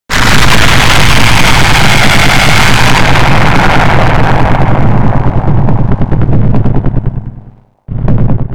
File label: jumpscare; oren sprunki